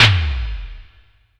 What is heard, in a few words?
Yamaha CS2x Synth Drum